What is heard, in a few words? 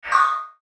Error Message; Hit, Electrical, Distortion, Bass; Multimedia; Production Element, Imaging Element, Accent, Transition; Electrical Button; Blastwave